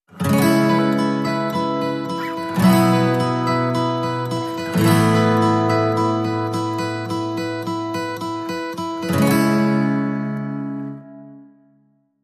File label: Bright Acoustic Days No Drums; 15sec Version C